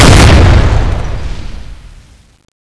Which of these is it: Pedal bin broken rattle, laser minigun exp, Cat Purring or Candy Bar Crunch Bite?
laser minigun exp